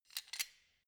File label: wrench close soft; schrauben drehen hydraulik